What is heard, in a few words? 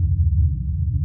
Hum; zps; Ambient; Hums